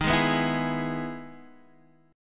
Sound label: E-Major D sharp